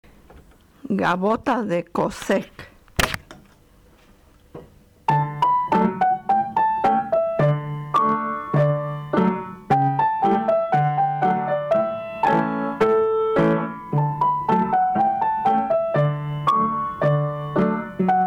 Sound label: Gavotte, pianomusic; Kosek